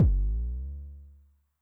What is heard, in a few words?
BD MOD Pitch Med Rise, bass drum, 909; classic machines; 909mod